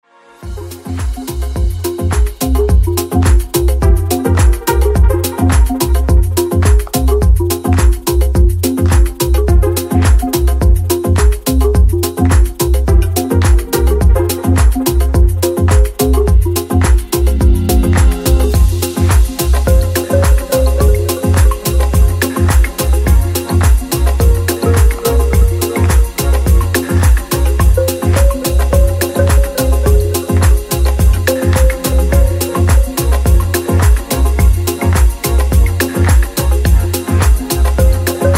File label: Marimba